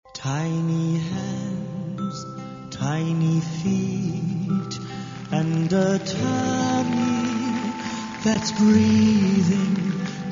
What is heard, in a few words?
Cradle Song